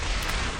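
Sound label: armor, movement; smite; heimdallr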